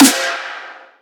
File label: snare; 7rystan's Snare Pack 2018